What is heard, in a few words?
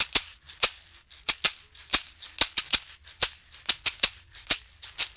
Berimbau Shaker; World Percussion